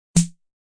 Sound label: Snare Single; Musical